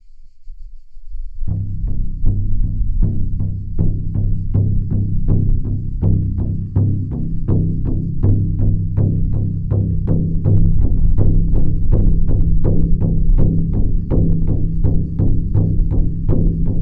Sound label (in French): gros tambour; VAPE